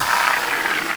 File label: Foam Espresso Fill; SFX Kit; Foley - Misc